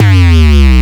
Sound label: BASS, Drum & Bass, 168 BPM; Ueberschall; Drum & Bass Flash Trackz